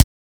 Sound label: Weapon Punch SMG; Warframe; Weapons; Weapon Punch Layers